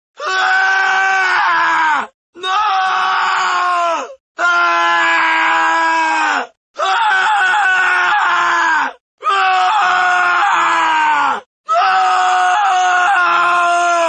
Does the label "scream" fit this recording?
Yes